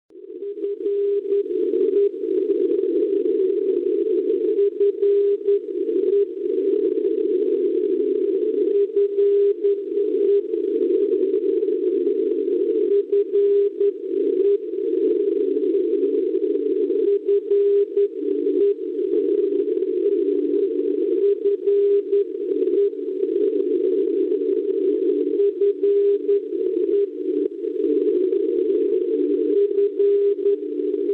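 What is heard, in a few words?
non-directional beacon 423 kHz; FE; Odense; Danimarca; Schwarzsee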